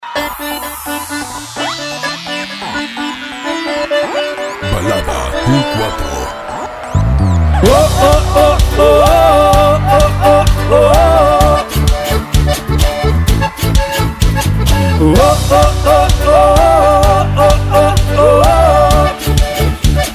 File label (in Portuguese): arrocha, sertanejo